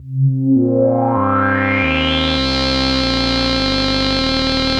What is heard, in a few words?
Arp C Line, ARP Odyssey; musicradar-synth-samples